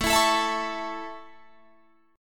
A Major, Guitar, Drop D

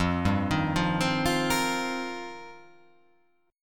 F 7th Suspended 2nd Suspended 4th

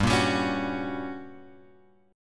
G Minor Major 7th Flat 5th, G; GmM7b5 Chord {3 1 X 0 2 2} strummed

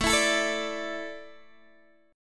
A Suspended 4th, down, Guitar, DADGAD

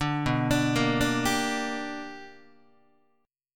B-Minor Major 7th Sharp 5th B down Guitar; BmM7#5 arpeggiated; 5,7,5,8,6,6; 4A,4E,5A,5D,5G#,6C#; JGuitar

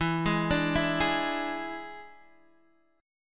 D Suspended 2nd Flat 5th E

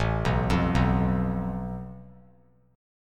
A Suspended 2nd Sharp 5th; Asus2#5; arpeggiated; 3C,3F,3A,3D; JGuitar

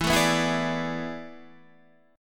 A Major 7th Suspended 2nd Suspended 4th

